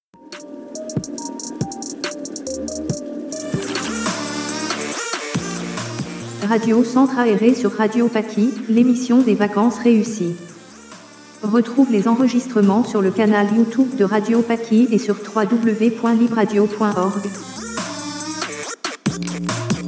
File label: Jingle